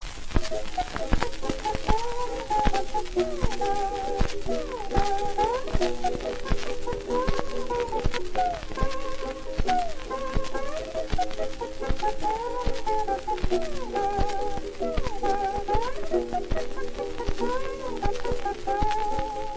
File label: Orchestra, Rippling Waves